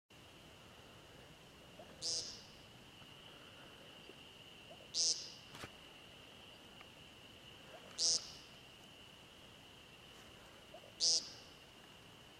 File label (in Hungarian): szalonka; vadhivosip